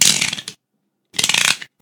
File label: cutter opening and closing